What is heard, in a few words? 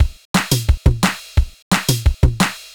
Breaks; neuro; Sinistarr